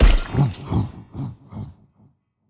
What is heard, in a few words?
BlockChain Release